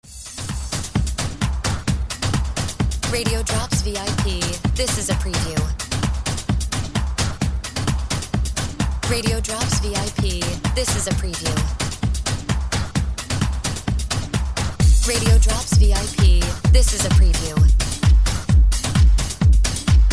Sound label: Music Bed